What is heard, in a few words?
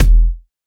Kick, Dance Drum Kit; Drum Oneshots; Twilight; Monosounds